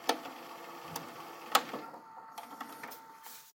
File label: VHS rewind takeout